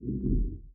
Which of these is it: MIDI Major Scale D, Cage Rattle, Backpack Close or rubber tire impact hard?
Backpack Close